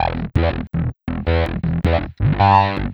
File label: Shreddy, Leads And Riffs, Funk; Sonic Foundry (Sony Creative Software); Synchro Funk